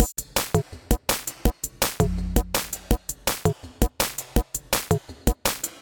606 165 Beat, Drum Machines; Cathode; Ableton

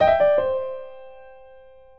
Phrase Blues Turn C Minor 120bpm, Grand Piano; MIDI Clips; Ableton; Factory Packs

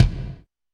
kick; Ableton Foundation FreePacks; Foundation Drums

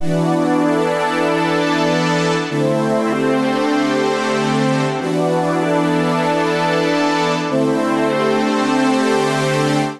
Space Pad Chords E Minor 96 bpm; MIDI Clips; Accompaniment; Ableton; Factory Packs; Chop and Swing

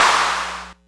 Clapbox Noise; Ableton Foundation FreePacks

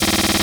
140 Garage Drum Fill; Dance DJ; Drums; Garage Breaks

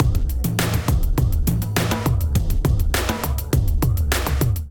Kit groove 102 bpm; MIDI Clips; Litmus; Ableton; Drum Essentials

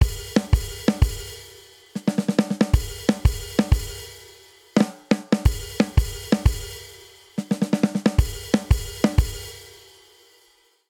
Swing Reggae Outro 88 bpm, drum; 4 4; MIDI Clips; Ableton; Factory Packs; Drum Booth

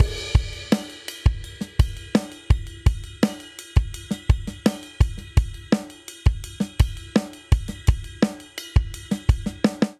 Straight Seven Rock Chorus 7/8 84 bpm; Ableton; Factory Packs; Drum Booth

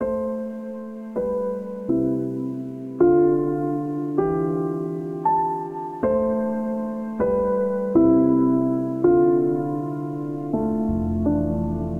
Missing You Piano, Amin 80 bpm; MIDI Clips; Tonal; Motifs; Ableton; Factory Packs; Mood Reel

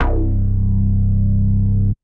Anxious Woofer, Bass

